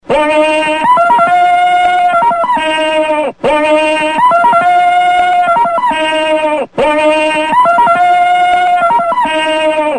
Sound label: tarzan yell